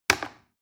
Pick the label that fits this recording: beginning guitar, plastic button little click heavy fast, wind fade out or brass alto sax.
plastic button little click heavy fast